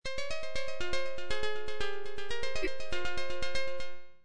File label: piano